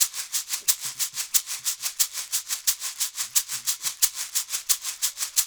LOOP, SHAKERS, Percussion

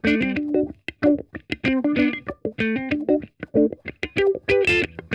ROLLER, Funk Guitar; Zero G - Funk Guitar

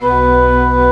ORGN, CHURCH ORGAN; Roland - LA Composer vol. 1

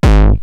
Roland TR-909 BD; BD Selection

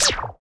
FX SYN, ANALOG; Sound & Vision - Gigapack II

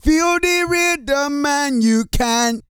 REGGAE, Vocal Planet World Voices

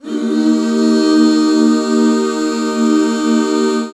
HAL EB, BACK CHORDS, Roland; Sound & Vision - Gigapack II CD 1

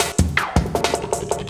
Loop, Drum & Bass, 160 BPM

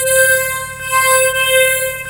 ring mod; Zero-G Ambient 1

